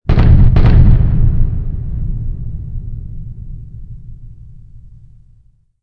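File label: explosion distant; modern warfare 2; amb elements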